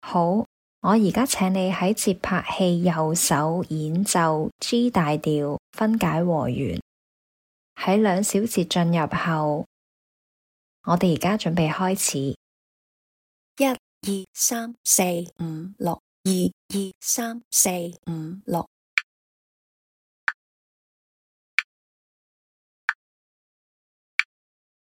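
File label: G major broken chord right hand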